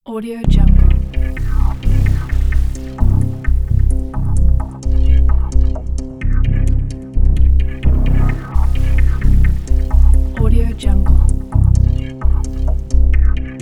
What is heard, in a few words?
minimal intriguing dark tension